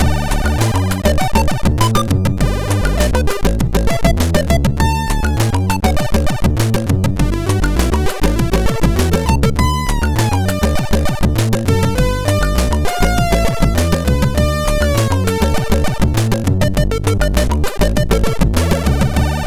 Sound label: Hot cat, MOS6581R2